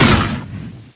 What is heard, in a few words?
Ball; TR1; Atlantean